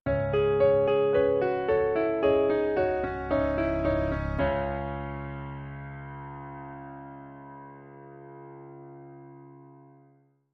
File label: Old Time Mystery Sting